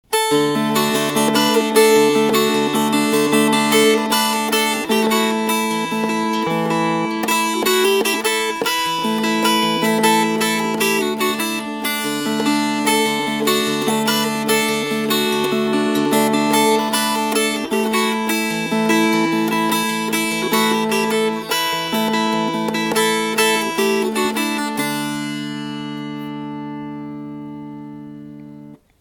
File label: Fingerpicked